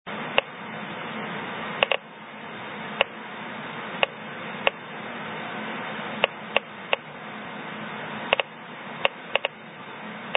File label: Thorite Kemp Uranium Mine Cardiff Township Ontario Canada 1 inch 10 sec gamma